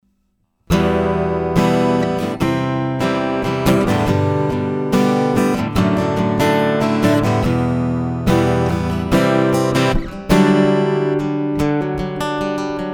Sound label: Fishman Aura Spectrum Strumming Ibanez AW54 Comp